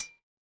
glass clink

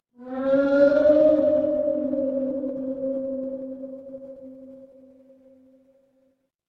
horror ambience dark

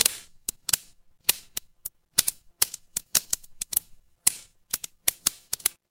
fire electric crackle

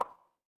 ui pop

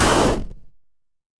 retro game explosion

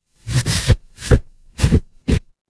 paper fabric fabric

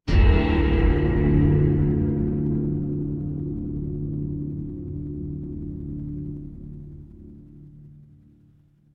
horror stinger

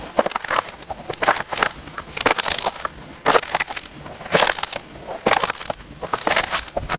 footsteps gravel

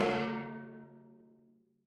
instrument gong

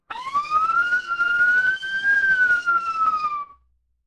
mechanical siren

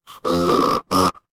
cartoon squeak

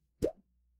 cartoon pop

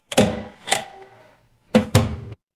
mechanical lever

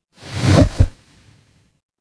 transition swoosh

